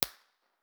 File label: koli snow, national park winter; 4 way mono processed